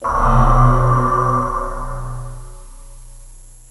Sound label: CAVERN